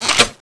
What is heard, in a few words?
speargun reload; speargunm; cstrike